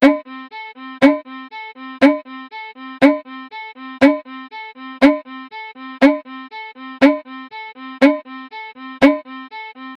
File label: violin with slow rhythm